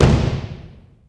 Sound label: AMB KICK, ACOUST KICKS; Greytsounds